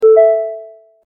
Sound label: connect